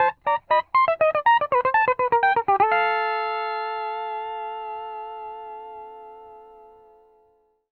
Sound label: PD LOOPS 120, Whole Lotta Country